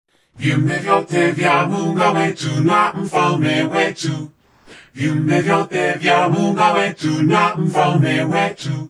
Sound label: choir vocal